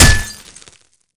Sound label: bottle broken hit flesh; tf2